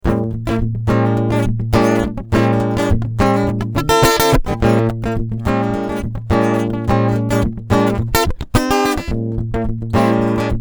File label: Piezo